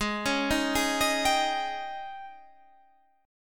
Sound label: G sharp Major 7th Suspended 4th, Guitar, Standard; G#M7sus4 arpeggiated; JGuitar